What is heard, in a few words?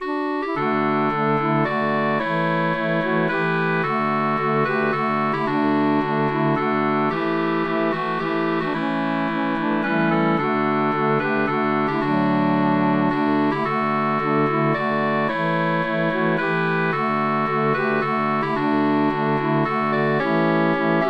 Waltz Melody Harmony; Helvetia